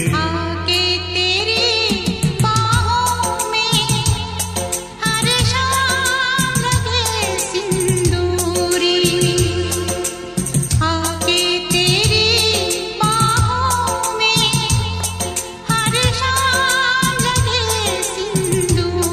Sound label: Romanric Hindi Ringtone